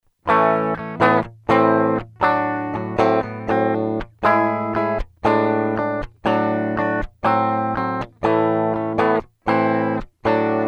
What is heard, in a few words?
Raw Gtr